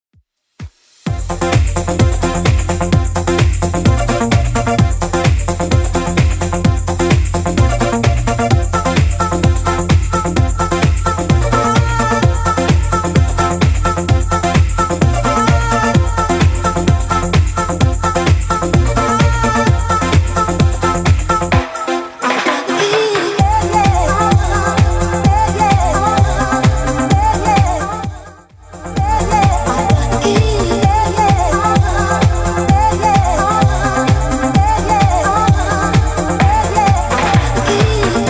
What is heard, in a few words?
90s Redrum 129bpm; remixplanet